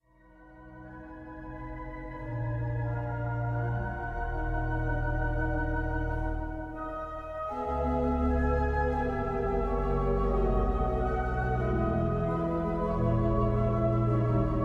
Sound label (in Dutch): orgelklanken; Bekende orgelklanken